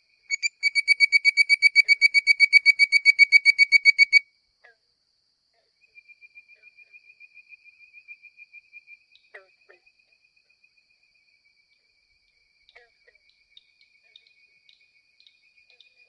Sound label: frog, Call bird-like with 2-5 whistles per second; Hyla avivoca